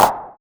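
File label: Clap filter, Drumsynth Drums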